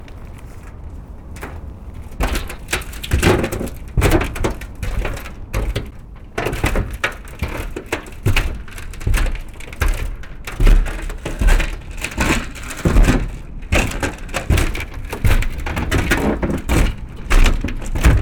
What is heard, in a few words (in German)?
Bruecke mit Schnee + Zug; London; Walkman Performance